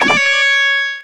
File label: Note Spreads; RockStar